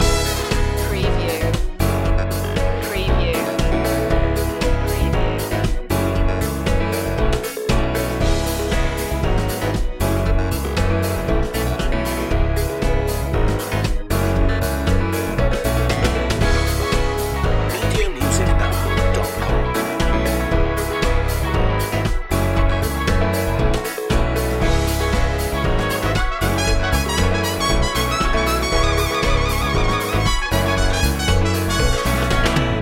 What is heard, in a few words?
fill the floor loop